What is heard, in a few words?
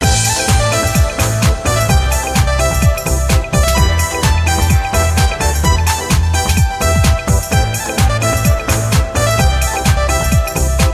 ringtone trance